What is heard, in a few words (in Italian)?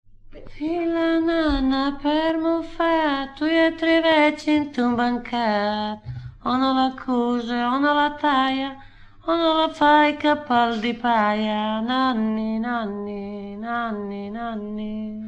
fi la nana, romagna